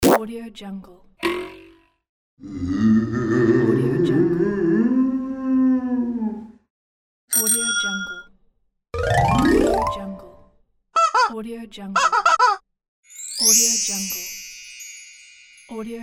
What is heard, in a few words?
cartoon sound effects pack